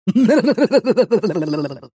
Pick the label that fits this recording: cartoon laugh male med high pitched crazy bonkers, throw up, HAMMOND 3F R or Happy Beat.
cartoon laugh male med high pitched crazy bonkers